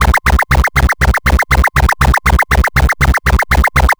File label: Synth